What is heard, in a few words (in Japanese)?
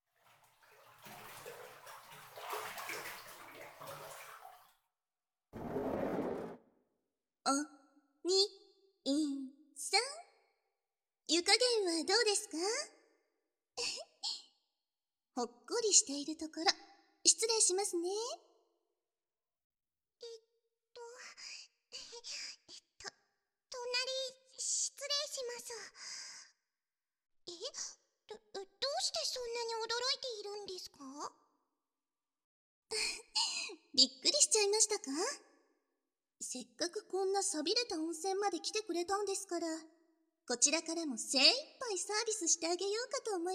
乳首責め、耳舐め手コキ, 无效果音; まずは……たくさんびゅっびゅして、スッキリさせちゃいましょうか♪